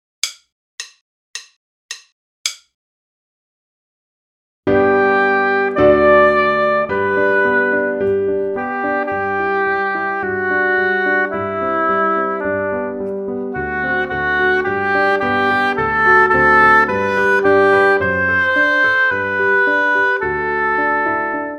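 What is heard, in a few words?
chorus, hautbois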